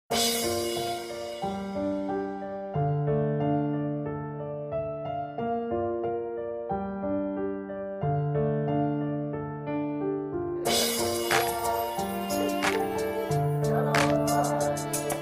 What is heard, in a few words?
Yemen Revolution Rap